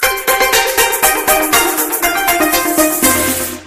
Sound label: chinese kung fu tone ringtone